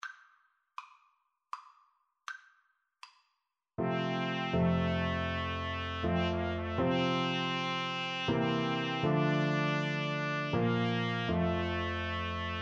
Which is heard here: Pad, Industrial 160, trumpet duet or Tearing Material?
trumpet duet